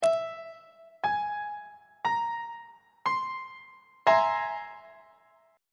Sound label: b sharp augmented 7th 1st inversion on treble clef